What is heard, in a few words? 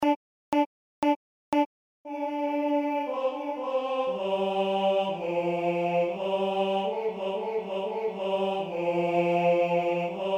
tutti